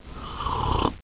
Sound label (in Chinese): 呼噜; 日常生活; 特酷声音素材库